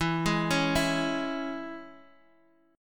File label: G sharp Suspended 4th Sharp 5th, E